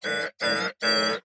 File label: urgent beeps; 堡垒（守望先锋2）/交流信号; 标记到敌人; 紧急的哔哔声